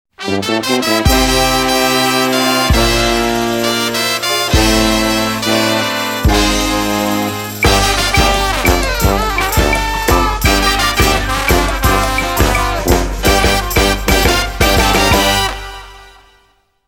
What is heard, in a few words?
Fanfare